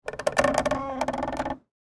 Creak Plastic Stress, Texture, Creak, Plastic, Stress; Creaking Sound Effects; Objects